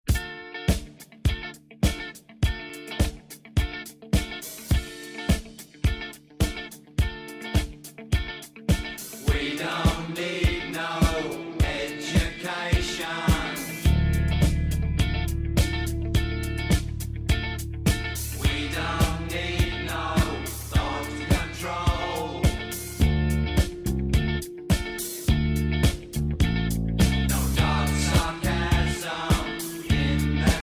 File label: ring; ringtone móvil